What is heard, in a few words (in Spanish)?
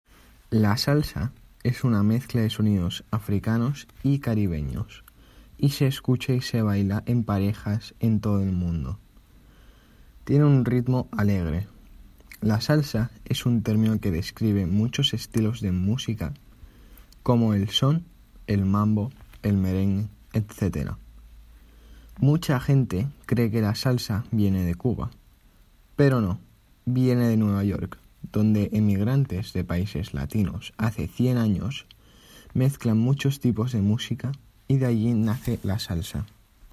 La salsa